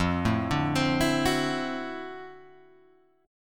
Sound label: D 9th F down Guitar Standard; arpeggiated; JGuitar